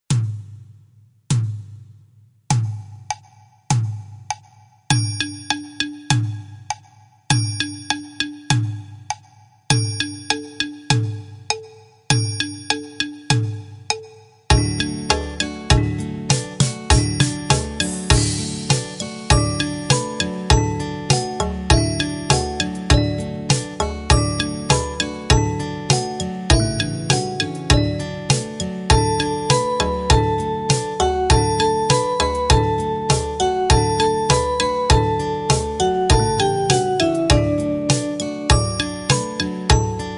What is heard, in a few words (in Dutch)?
raadselliedje karaoke